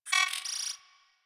Cipher Threat Short, UI; Warframe

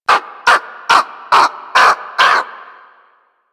Bird Of Prey Vocal Idle Beg; Warframe

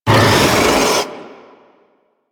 Rock Punch Cast; Warframe; Brawler

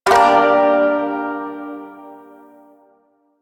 Shawzin Min Chord H, Music; Chords

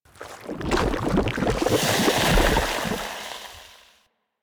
Landscape Coolant Bubble; ambience; props; one offs; Warframe; Venus Open World

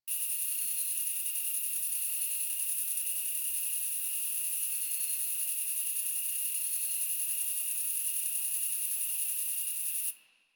Insects High Loop C; Ambience; Props; Loops; Warframe; Eidolon Plains

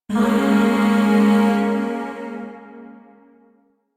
Zariman Void Shawzin Yo Chord

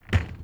Wood Stool Impact Light

